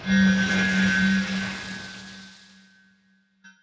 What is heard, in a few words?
lose; DoubleTigersWD; wazdan